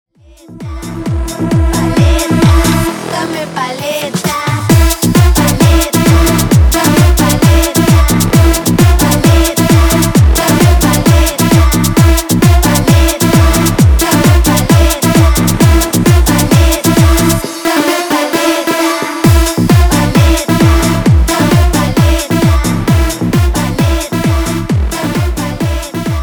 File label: tribal remix 132 bpm